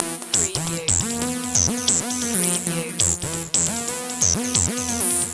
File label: Hip Hop Synth Licks 90 BPM - E - Swing